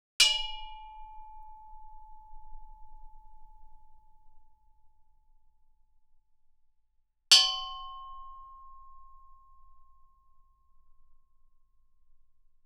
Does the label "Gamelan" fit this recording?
Yes